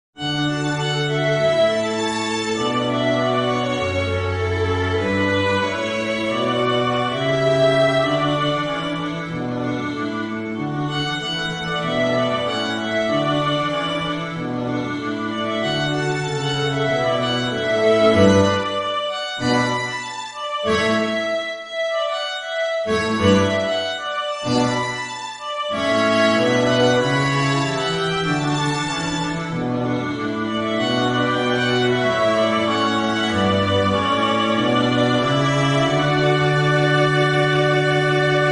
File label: Main Theme, String Quartet; MLP FiM